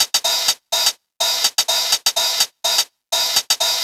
drum twosna hat 125, Classic Chicago House Drum Loops